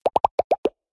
Mutliple Bubbles Bursting

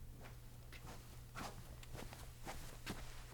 Foot Step On Carpet

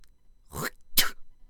Throat Clearing And Spit

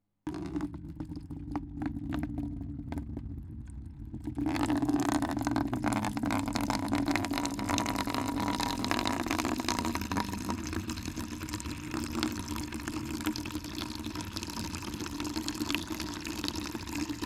Water

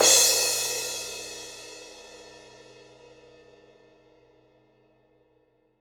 Crash

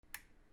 Lamp Switch

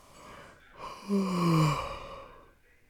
Yawn Yawning

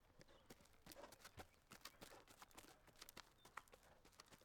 Horse Walking On Gravel

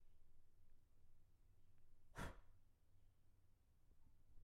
Blowing Out A Candle

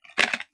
Ammo Pick Up

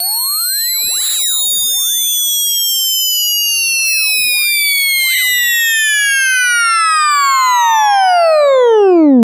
Crashing Computer

